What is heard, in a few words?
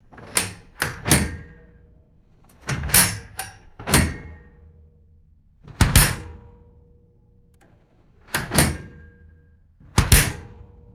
repeated metallic electrical panel door closing